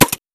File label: ar2 altfire, weapons